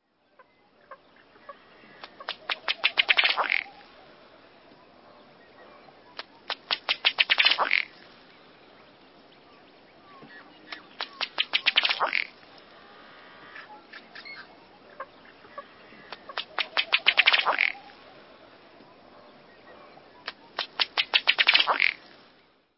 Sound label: Ruddy Duck; Birds